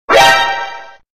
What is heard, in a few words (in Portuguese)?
som de susto; youtuber